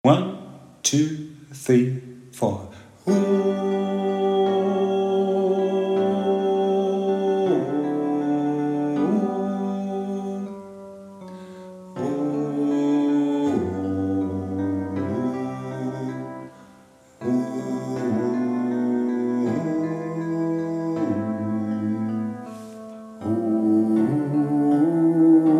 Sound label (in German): Bass, Popchor